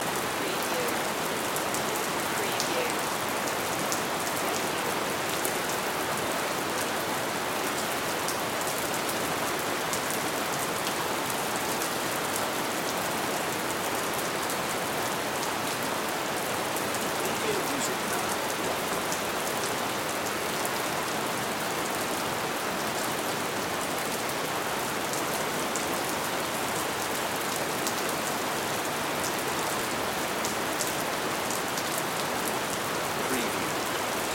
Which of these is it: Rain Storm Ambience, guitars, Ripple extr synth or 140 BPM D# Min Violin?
Rain Storm Ambience